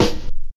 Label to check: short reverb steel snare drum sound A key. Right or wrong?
Right